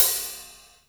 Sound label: Ride, Cymbals; Vengeance Samples